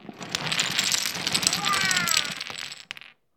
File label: Baby Spill Wooden Blocks